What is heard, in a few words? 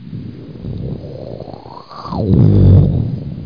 thrust; Leisure Game Pak